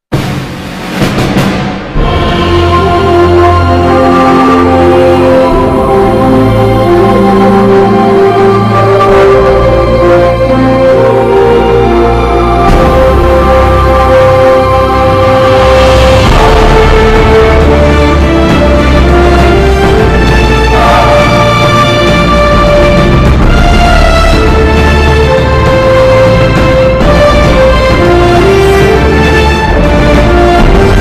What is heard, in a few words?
Korean Drama Opening; Jumong OST